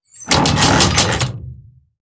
Lever Jam With Power

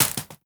Hit; moul

